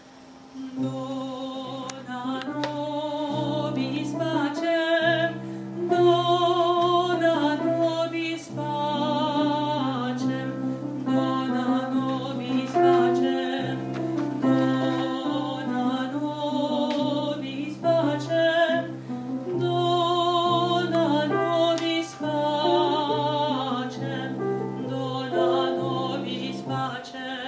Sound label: alto; agnus dei